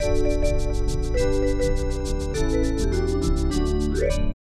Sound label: Level Complete; magicboy; snes